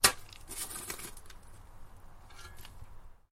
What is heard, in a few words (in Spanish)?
cavar